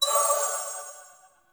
Choir Bow Charge Full; Warframe

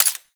Bladed Pistol Mech; Warframe; Weapons; Tenno

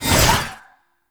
Whip Swipe; Warframe; Melee; Dagath Blade And Whip

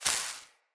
Casting Device Projectile Destroy; Weapons; Player

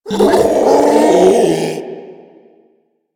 Infested Ancient Strike Scream; Enemies; Warframe

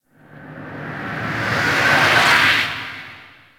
Ship Gun Battery Beam Void Burst Close; Corpus Ship

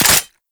AK47 Dry Fire; Warframe; Tenno

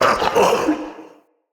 Male Vocal Pain; Enemy; Mockery; Mockeries

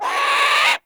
Bird Of Prey Vocal Scared

